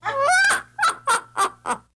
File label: Mynah Bird Laugh